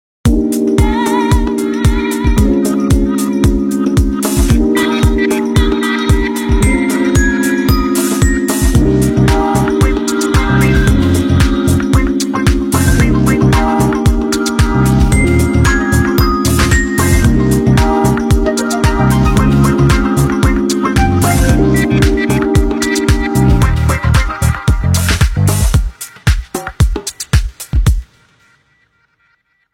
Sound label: Chipper, Ringtones, Nokia